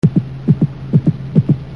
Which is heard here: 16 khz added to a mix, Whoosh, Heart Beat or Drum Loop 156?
Heart Beat